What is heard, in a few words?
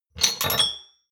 shot glasses grab multiple hard; berufe; gastronomie; soundpool